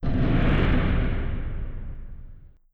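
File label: Collision Interior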